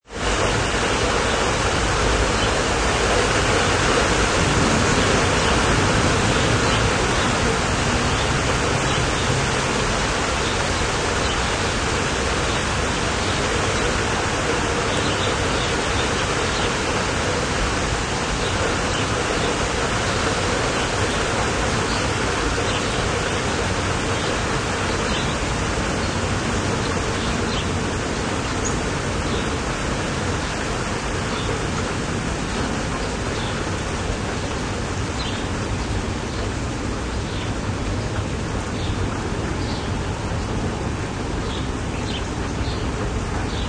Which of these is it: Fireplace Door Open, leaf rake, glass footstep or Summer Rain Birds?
Summer Rain Birds